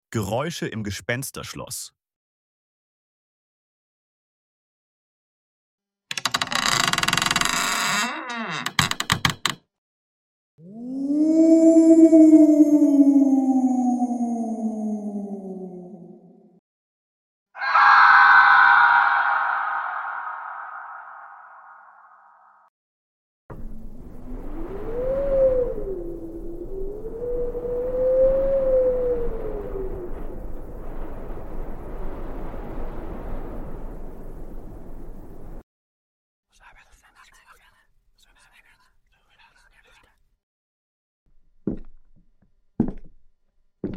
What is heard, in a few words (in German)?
Geraeusche; Schutz des Waldes